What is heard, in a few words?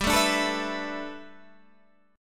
E 7th Suspended 4th G, guitar; E7sus4/G; strummed